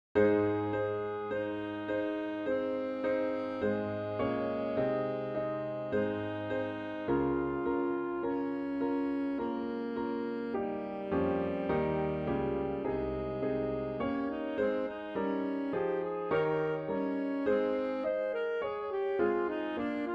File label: Adagio and Allegro adagio only Alto Sax w Piano; HANDEL